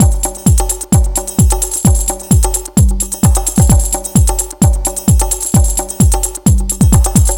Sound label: House